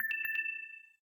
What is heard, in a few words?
Low Battery In Call; LG; G3-Stylus